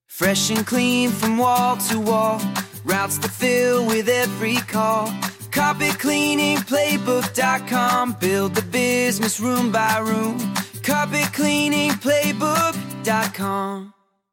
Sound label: jingle